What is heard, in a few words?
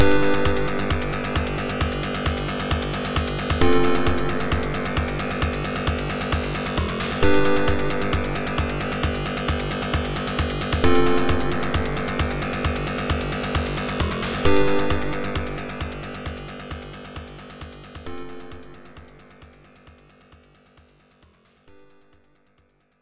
Quiz Opening